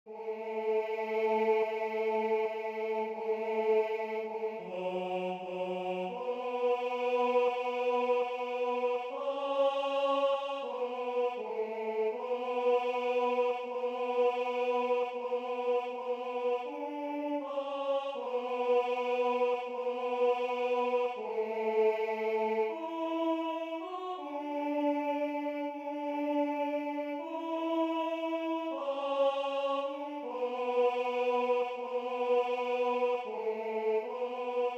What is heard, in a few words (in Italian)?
Tenore; Base tenore